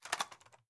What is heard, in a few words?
rattle; tacz; daffas arsenal